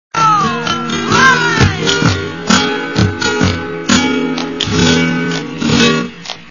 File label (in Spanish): buleria SLOW